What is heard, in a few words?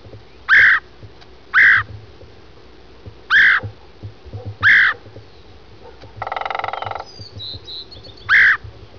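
red-headed woodpecker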